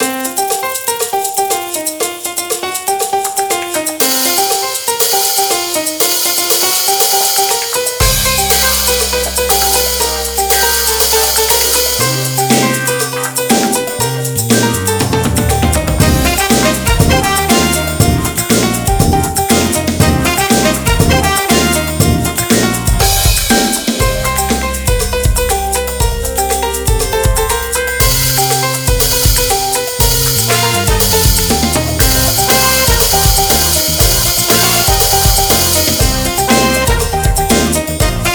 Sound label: Drums